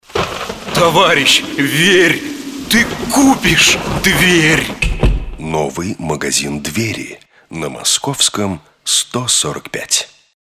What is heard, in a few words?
door